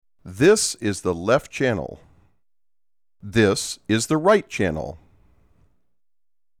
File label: Left-Right